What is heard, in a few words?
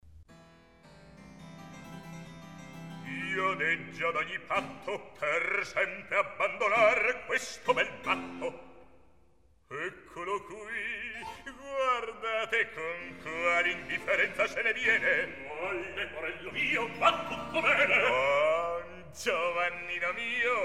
Recitativo; Io deggio ad ogni patto; Mozart, Wolfang Amadeus; Don Giovanni